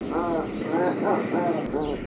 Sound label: Felis manul; Pallas cat; The Pallas cat miaowing calls sound unusually low